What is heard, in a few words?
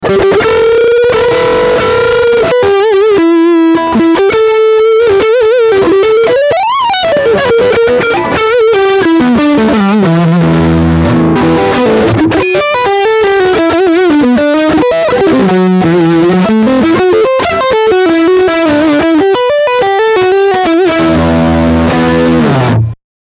Lick Front pickup